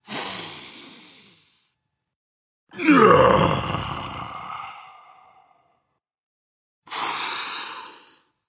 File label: Breath Dragon; fafnir